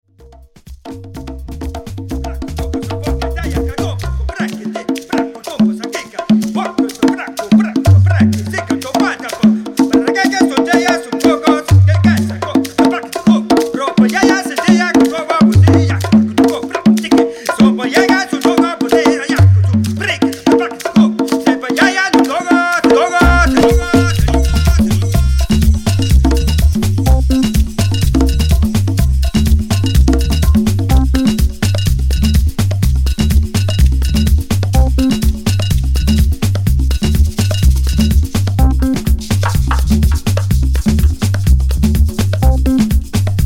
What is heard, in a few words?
percussion sample